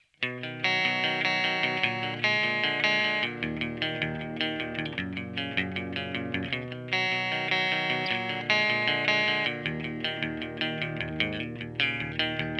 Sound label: guitar